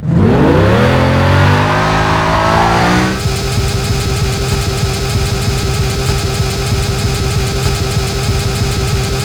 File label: v8 first gear rev loop